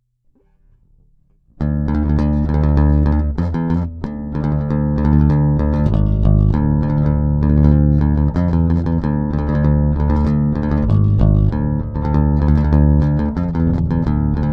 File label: D Riff